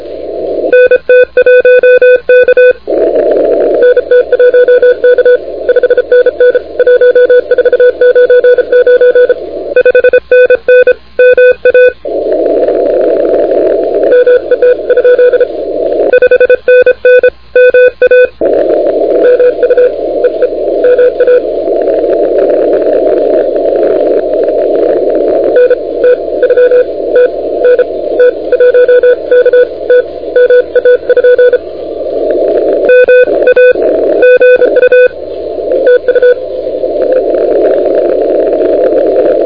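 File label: continuous wave 28MHz; ARRL10M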